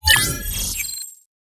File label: ui roundstart